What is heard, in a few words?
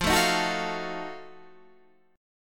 F 7th Flat 9th, Guitar Open D